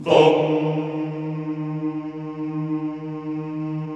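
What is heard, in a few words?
Vohmm Houuu, Chorus; Sound & Vision; Gigapack I CD 2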